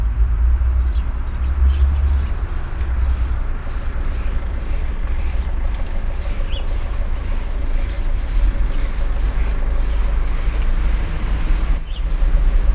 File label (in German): spatzen + buergeramt, Fieldrecordings; simon dach ecke wuelisch; kuehlgeraet